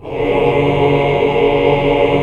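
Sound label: chord 2 2 L; Best Service Gigapack 1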